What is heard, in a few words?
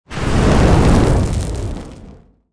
Ui Title Appears; Ui Title Rampage Appears